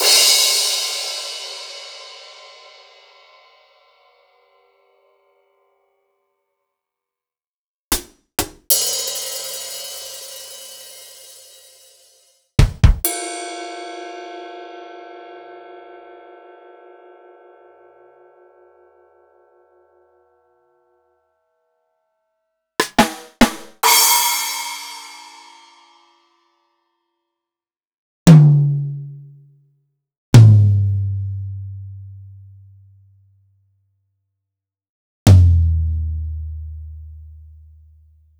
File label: Akai MPC500